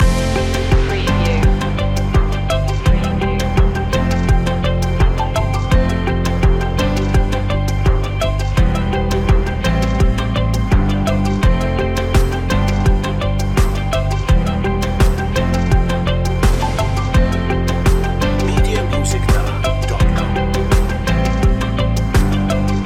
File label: breaking out loop